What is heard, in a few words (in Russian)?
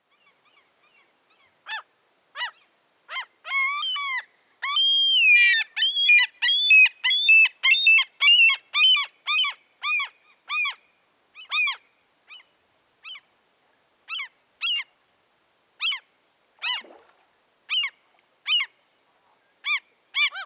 sizaya chayka larus canus, zvuki chaek